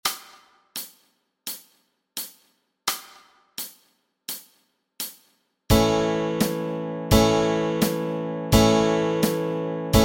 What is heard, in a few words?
Chords Track, Easy Guitar and Ukulele